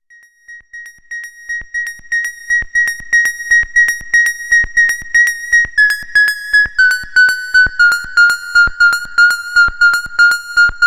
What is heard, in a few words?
arp2600; bladerunner